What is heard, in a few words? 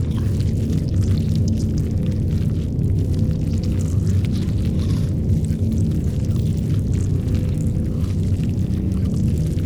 ant ship titan idle; Stellaris; biogenesis; ships